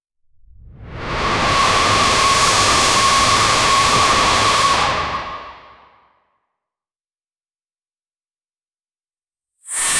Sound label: anxious aura quirk; spinda; quirky